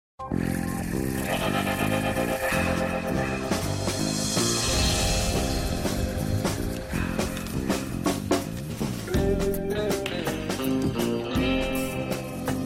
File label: ringtone; romanzo criminale